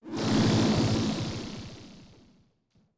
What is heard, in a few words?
teleport